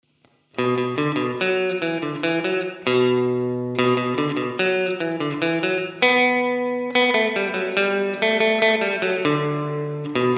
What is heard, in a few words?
Room